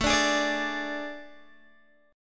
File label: A Major 7th Suspended 4th, Guitar, DADGAD; chord